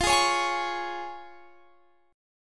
F Minor Sharp 5th F down; strummed

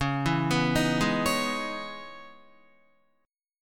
Db Minor 9th Guitar; arpeggiated; 4E,4A,5D,5G,5C,6F

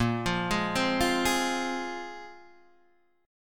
Bb 7th Suspended 2nd Bb, Guitar Open D

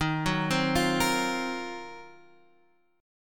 D sharp Minor 6th Add 9th; D#m6add9 arpeggiated; 11,9,10,10,11,x

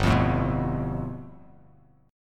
G 9th, Bass Standard; strummed

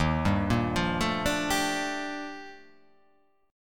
D sharp Major Sharp 11th D sharp, chord; chordshape

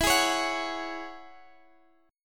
E 6th Add 9th, Guitar, DADGAD; chord shape

